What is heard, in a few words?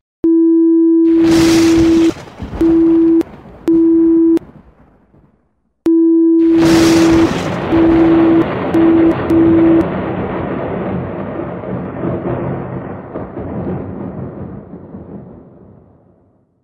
Thunder; lightning machine; audio activated switch lightning machine